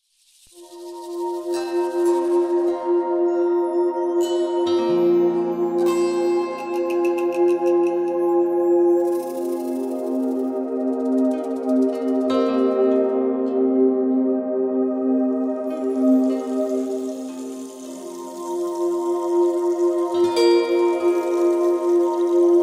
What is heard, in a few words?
zen far east sounds